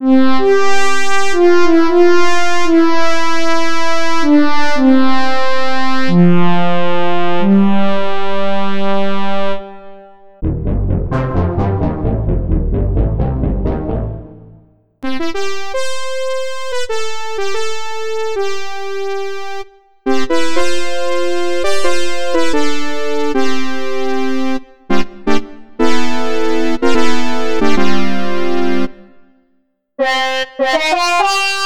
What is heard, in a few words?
Synclavier Brass